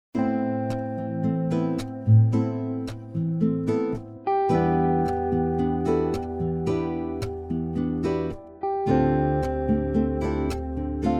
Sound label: guitar